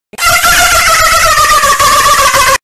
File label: dolphin laugh; flightreacts